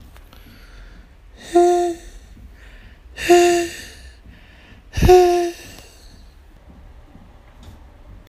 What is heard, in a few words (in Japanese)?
ピュアファルセット